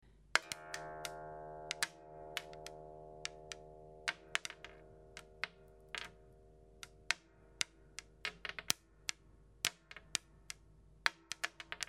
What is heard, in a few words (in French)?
crin, harpe